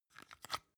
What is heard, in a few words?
plastic hook fold out